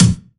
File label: BD, BASS DRUMS; EdgeSounds